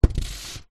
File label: polystyrene expanded block down drag; foley